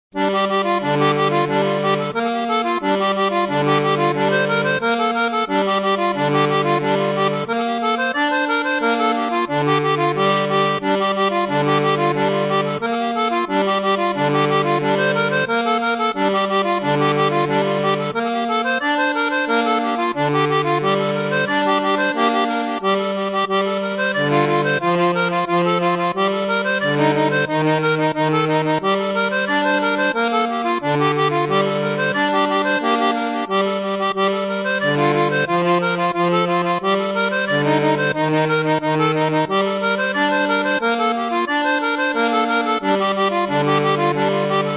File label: Accordina Harmonicon; Akkordica; Syntheway